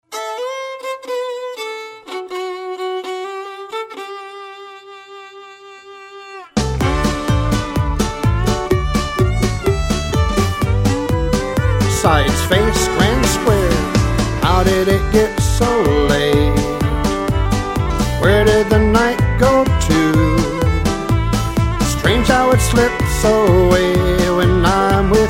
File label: vocal